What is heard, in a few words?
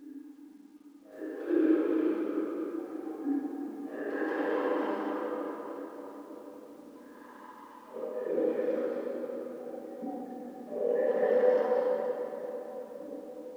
Splashes, Chalice Well